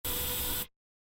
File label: robotic arm short; soundscrate